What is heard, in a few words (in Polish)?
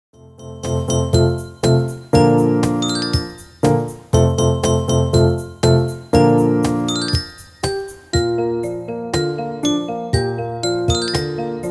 przywitanie na scenie instr